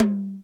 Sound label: Roland U110 Drums G4